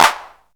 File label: hand clap sound A key; percussion; single hits one shots